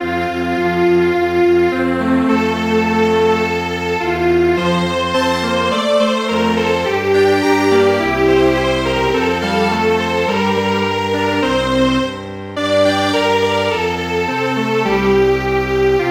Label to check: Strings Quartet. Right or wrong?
Right